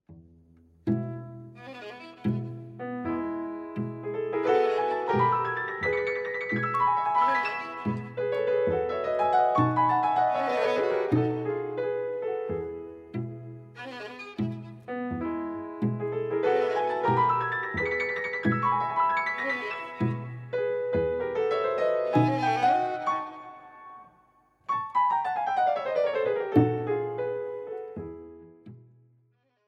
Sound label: Rhapsodie Hongroise IX for Violin, Cello and Piano; Classica; Trio; Trio Dmitrij